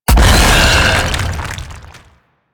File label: Infested Bone Blade Tentacle Spike Impact; Warframe